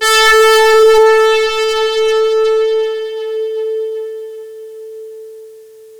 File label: big brass A3